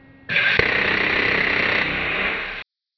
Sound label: Vulcan gun